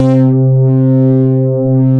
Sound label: Synthesizer Middle C Notes, Lead; instruments multisampled; synth keys